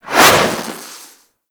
Jet Polearm Spin Whoosh; Warframe; Grineer

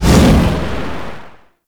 Void Dragon Firebreath Stop; Enemies; Abilities; Warframe; Duviri

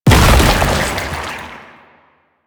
Rolling Rot Proj Explosion; Enemies; Abilities; Warframe; Proto Infested; Babau

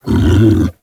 Kubrow Tame Idle Stand Up A; Warframe

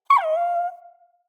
Wolf Cub Trapped Call Close; Animals; Wolf Cubs; Orengal Wolf Cub B

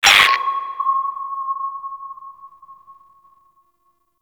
Order Ping; Gameplay; Kahl; Warframe; Veilbreaker; Devourer Kahl

